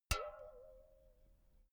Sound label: filled can hit ringing long